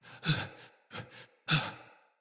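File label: Cry Heavy Run, Barks; Battle; Envoy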